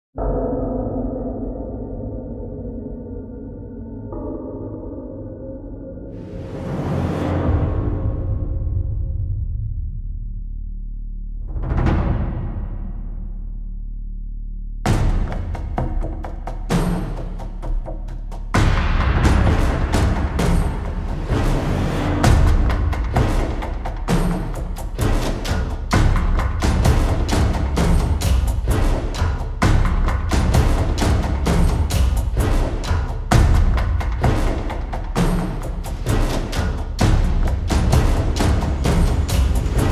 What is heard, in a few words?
Percussion; Fired Earth Music